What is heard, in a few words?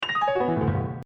Counterclockwise fifths